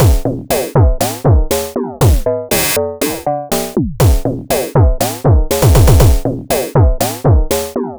Electronica Drumloop; Drums

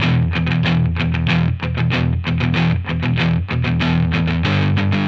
Electric Guitar, Loops; Guitars

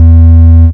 BASS; SoundFactory

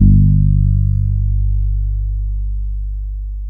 DEEP BASS; SoundFactory

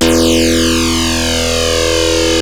sync lead, yamaha rm1x synth; bass and lead

